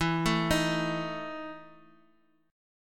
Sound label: E Major 7th Suspended 4th E down; EM7sus4 arpeggiated